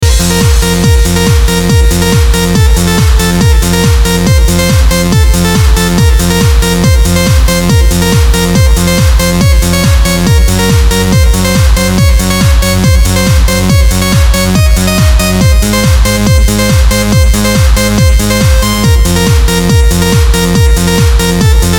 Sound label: adagio for strings